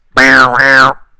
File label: error; SFML